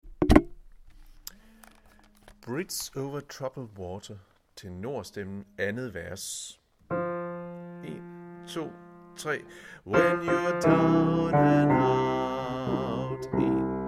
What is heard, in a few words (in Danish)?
tenor; harlev koret